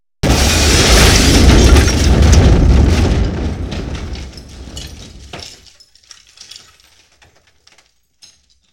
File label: Explosion, 5.1 Surround